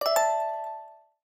Prompt

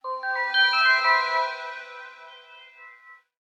SMS Relax, message notify; SKY IM-A730S-VEGA-S

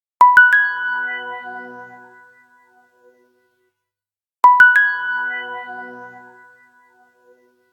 calendar alarm default, phonetones Nokia N900

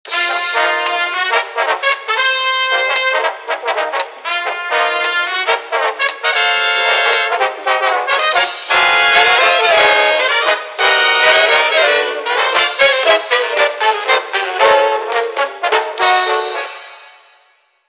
Getting Busy, ringtones, Motorola Backflip

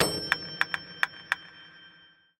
Rain Dance (short)

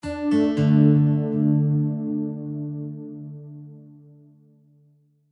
shut audio; Vodafone; Smart-Mini; switch on off

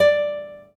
Classic Guitar, notifications; Oppo Find X5

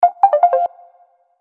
REPORT WARNING; Samsung; SGH-J700

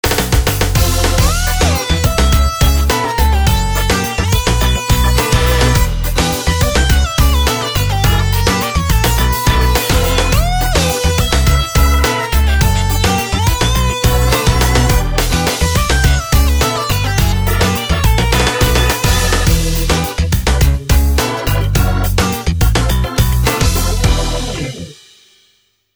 Funky Feathers; Sony Ericsson; PlusFourSix; Jie (K630i)

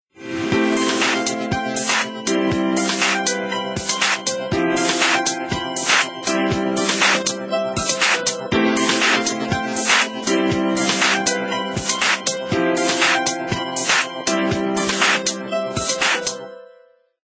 Ring Rising, Samsung SGH i617 BlackJack II

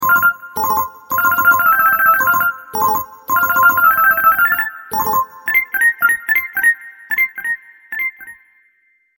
Cuckoo Clock; Samsung SM-B690V Convoy 4

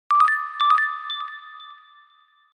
message, notifications; K-Touch; Nibiru-H1

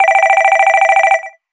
Business Ring; LG MX310

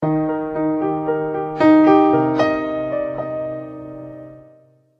Tranquil Sea, ringtones; Acer; CloudMobile-S500; ICONIA

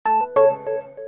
close; LG KU3800 Bubble Pop

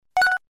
Connect; LG B470

